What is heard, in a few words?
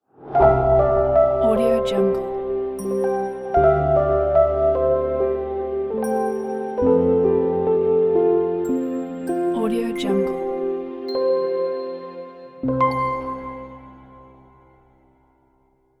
advertising logo